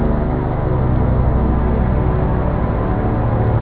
pad, synth chords

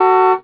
honk; BESTSHOT; noisybit

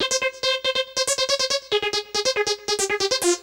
Techno, Melody, C4; Techno Music Maker

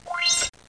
Arrow

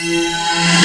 scraping; Picture Me

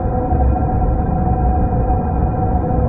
engine; Earth and Beyond; EA Games

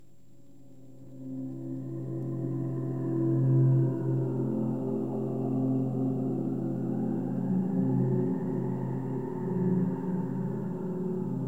Intro Music

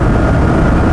BIG JET

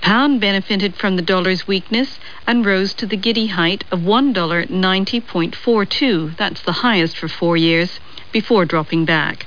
bbc news; Amiga 8-bit Sampled Voice

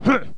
jump; PC Gamer IT CD 45; SEED demo; hero

